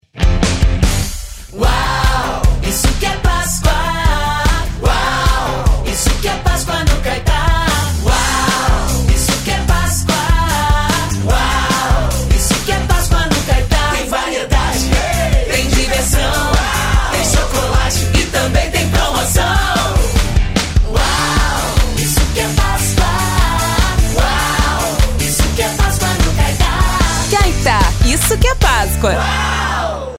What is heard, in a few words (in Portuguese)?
jingle